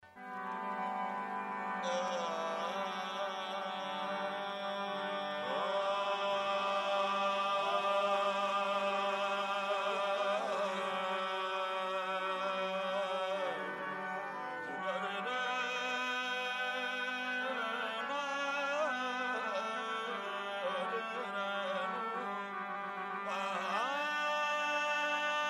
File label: Sufi Devotional Song